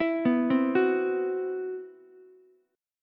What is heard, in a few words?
C Major 7th Flat 5th, Ukulele, chord; CM7b5 arpeggiated; JGuitar